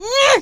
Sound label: impact; episodic; episode 1; Source engine